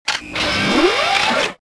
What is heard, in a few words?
startup, turret